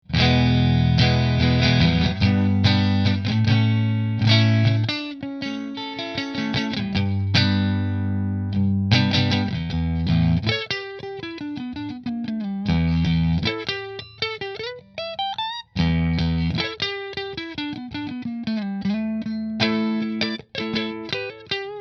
Silverado Position 3 Fender

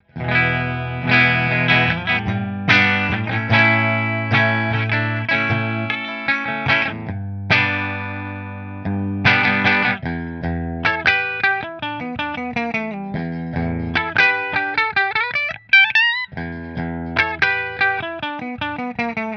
Burny Bridge Fender, guitars

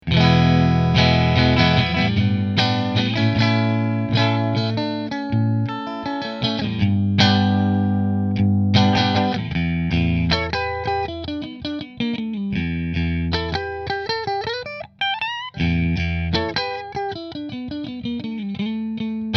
Nola Voodoo Neck Middle Fender, guitars

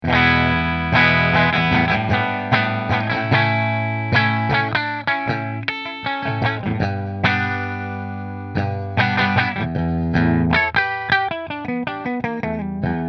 Guillfords Bridge Fender, guitars

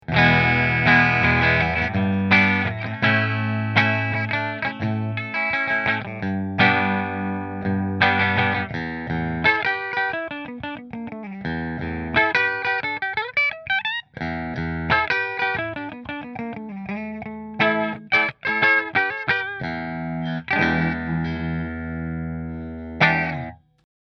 Rahan Double Maple Position 1 Fender, guitars; Rahan Guitars RP Double Cut Figured Maple

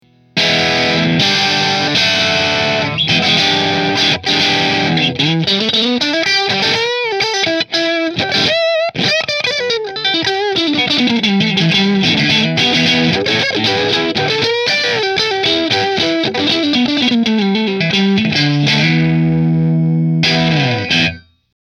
Nola JB14 Driftwood Neck Middle Marshall

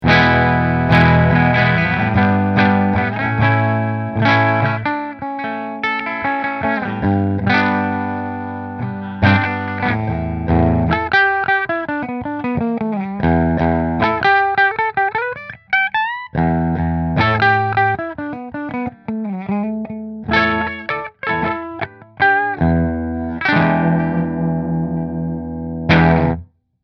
Robin Avalon Bridge Fender

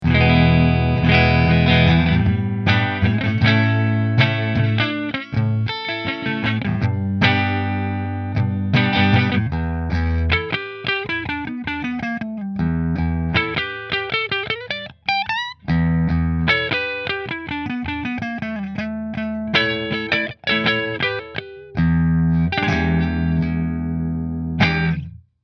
Nola JB14 Flame Neck Fender

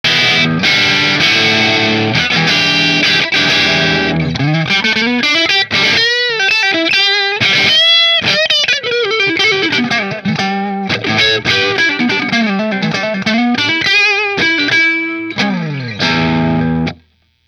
Guillford neck marshall, guitars

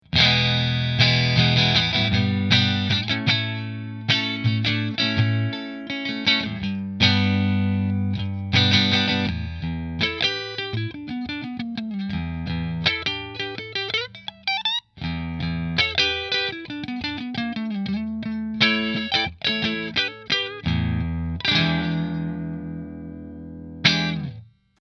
Melancon Neck Mini Position 2 Fender, guitars

